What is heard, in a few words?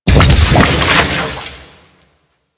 Goo Gun Sack Large Explode